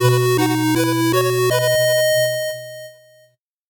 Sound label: 8bit jingle slow